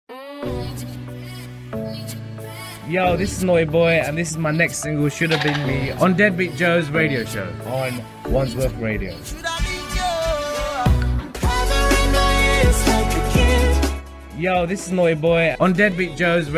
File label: mixed jingle; wandsworth radio; deadbeat joe